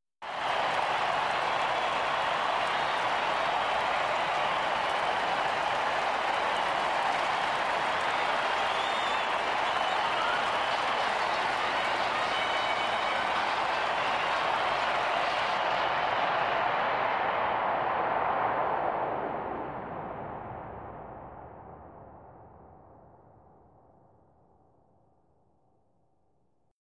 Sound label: Arena Crowd Collaps; sports arena